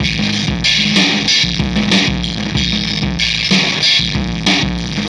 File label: System Overload Beat, Processed Drumkits; Sonic Foundry (Sony Creative Software); Processed Drumkits Zero Gravity Beats